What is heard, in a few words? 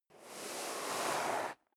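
step sneaker sand slide long, footsteps; schritte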